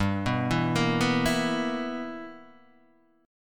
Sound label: B Major 7th Suspended 4th Sharp 5th G